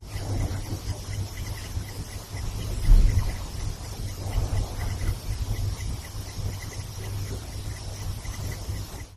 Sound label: clicking